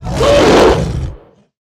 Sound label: gorilla Win Roar; netent